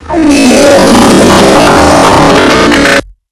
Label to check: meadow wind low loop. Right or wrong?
Wrong